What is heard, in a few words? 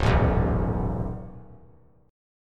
Db 7th Suspended 2nd Sharp 5th Db down, chord shape; Db7sus2#5 strummed; JGuitar